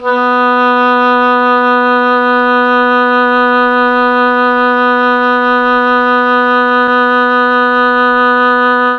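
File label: B1 oboe, chamberlin; CHMBLN OBOE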